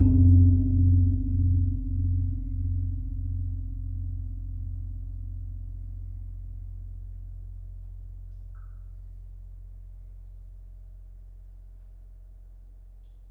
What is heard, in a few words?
Gong Ageng, gamelan